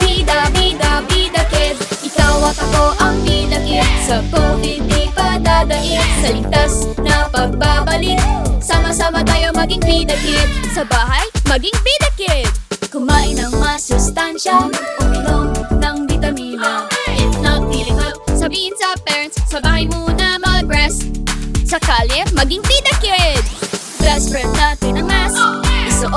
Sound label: Jingle TEEN VERSION; BIDA KID; 60s